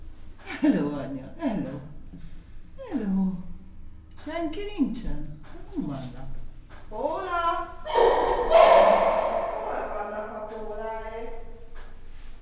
hello anya, csimpanz; gyori zoo 2014

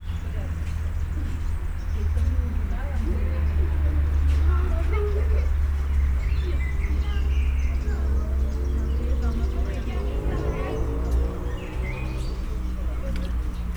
vizet lefetyel, oroszlan; állathangok; nyíregyházi zoo 2010

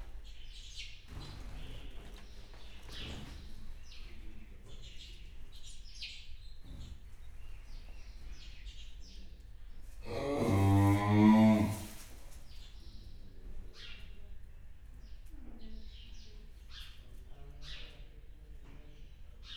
5 hónapos bika, őstulok; Hortobágyi Nemzeti Park

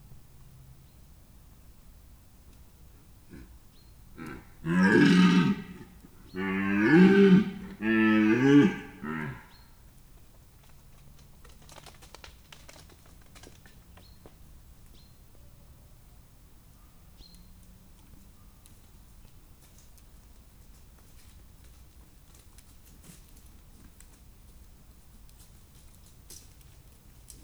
gímszarvas jobbról makkpotyogás; budakeszi zoo 2010

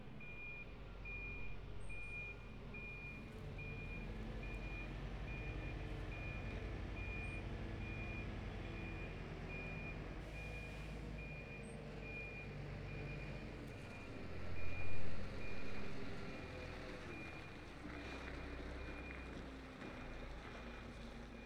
csütörtöki begyűjtés, szemetesautó; varboc

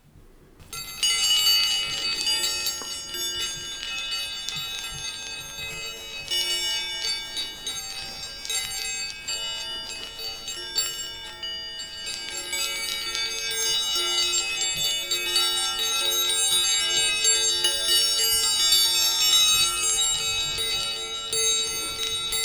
tenyérmozgatás, golyók tenyérben mozgatása; Perkupa; hangkeltés

